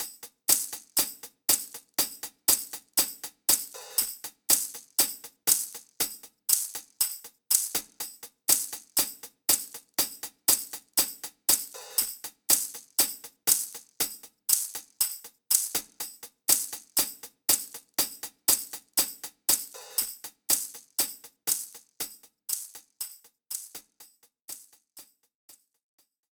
Music Test Drums Loop; Bound by Flame

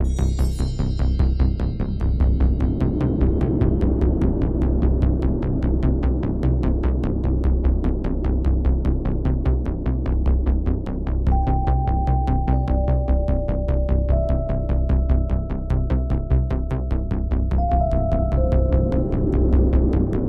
Omega Scan FX Stem Loop; Headlander

